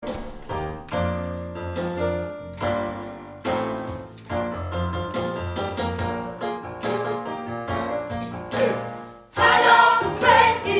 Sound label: soprano